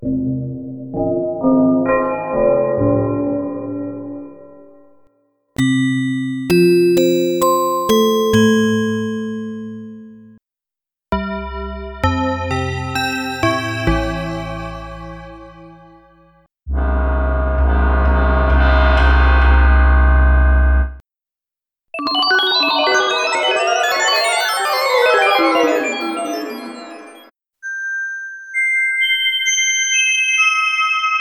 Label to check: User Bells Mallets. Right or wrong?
Right